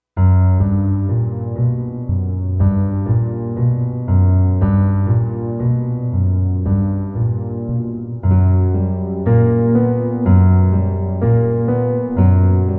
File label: spooky